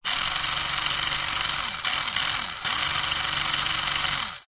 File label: leisure radio controlled car motor wheels free wheel close up; zapsplat